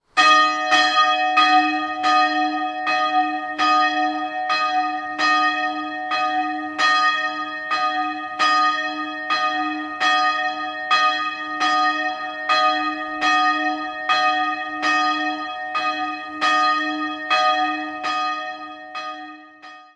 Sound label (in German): Kipfenberg, Glocken, Evangelische Christuskirche; Einzelglocke es''; 1956; in Erding gegossen; Karl Czudnochowsky